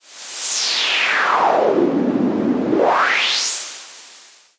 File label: noise Modular, Noise Sweeps